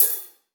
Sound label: shaker sample b key; percussion